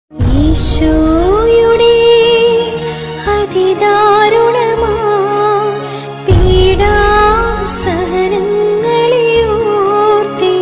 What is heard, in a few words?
christian devotional song